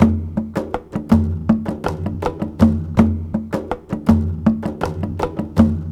Incredible 162, Jungle Straight Loops; Zero-G Jungle Warfare 1, 2 & 3

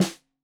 Snare 65 Reg Stereo, Deeply Sampled Drums

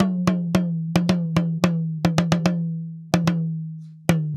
Talking Drum, African; Sonic Foundry (Sony Creative Software); Continental Drift Aftershocks

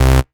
Basses Root C; Vengeance - Essential Clubsounds Vol.4; Synth Shots and Basses; Note C

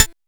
Synth Sound; VEE Synth Shots

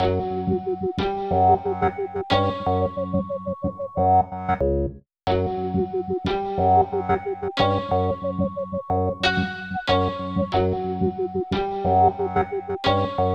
Melody

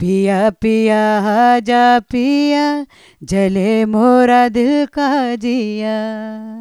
Chorus, Vocals Female; Sonic Foundry (Sony Creative Software); World Pop

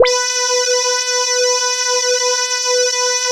CHUNKY B5, Drums; Drums In Your FACE!

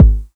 KK TR909; DRUMMACHINES

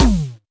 Percussions

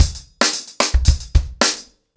110BPM, ACOUSTIC DRUMS

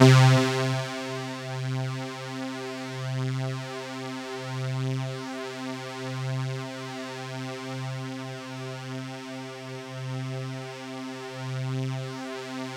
C3, Synth Line

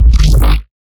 Bass Shot; neuro; BIG5AUDIO

